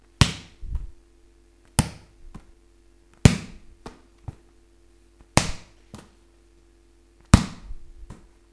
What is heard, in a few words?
Ball hit